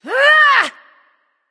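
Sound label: battlecry, female; smite; voicepack; hera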